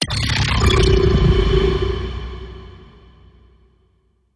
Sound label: AVAS Melody Car Finding